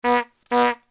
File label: HONKHONK; VALUPACK